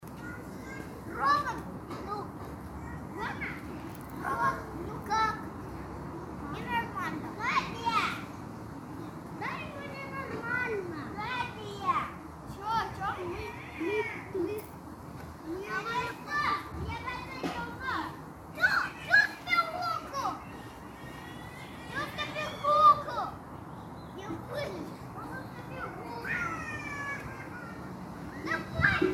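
children playing in the yard, distinct remarks audible, 6-8 years old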